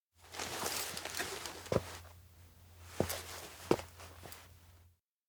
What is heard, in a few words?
steps outdoor crystal cockpit dismount; anemoi